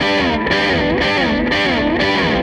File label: Les Guitar Loop